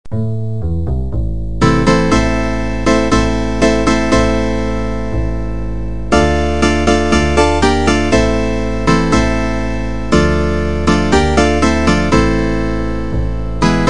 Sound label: ose shalom fortepiano